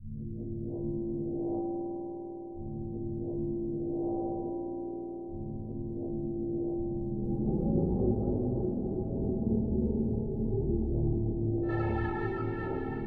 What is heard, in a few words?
glow atmospheric background